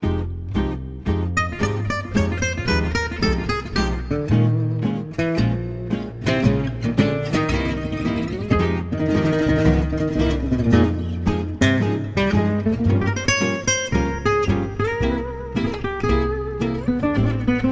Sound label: Gypsy Jazz Manouche